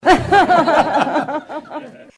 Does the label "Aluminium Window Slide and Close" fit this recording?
No